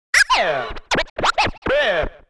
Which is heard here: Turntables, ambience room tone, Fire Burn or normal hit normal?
Turntables